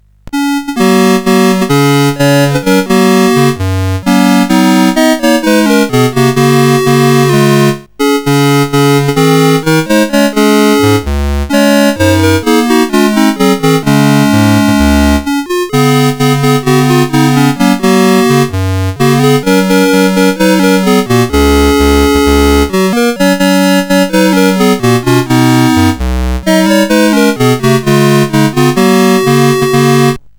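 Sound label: Ocean Ranger, MOS 8580 SID chip music, games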